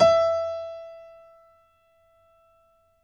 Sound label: piano; East West; The Ultimate Piano Collection